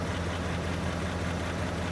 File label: loop Coupe, kart